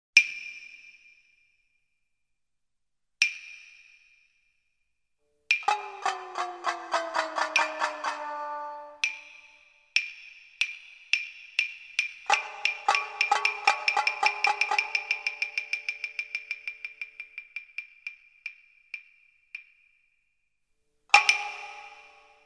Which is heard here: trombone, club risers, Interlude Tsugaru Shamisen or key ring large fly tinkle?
Interlude Tsugaru Shamisen